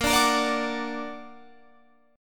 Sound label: Bb Augmented Bb down Guitar Open F; strummed; JGuitar